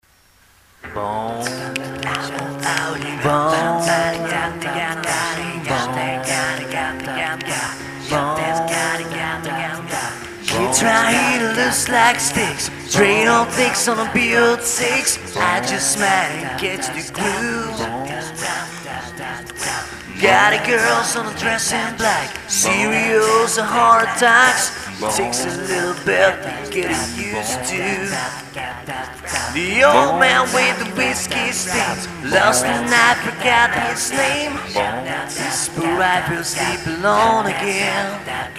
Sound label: Acapella